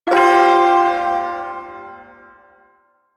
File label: Shawzin Pent Min Chord F; Warframe; Duviri Ersatz Shawzin